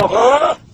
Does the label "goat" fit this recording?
Yes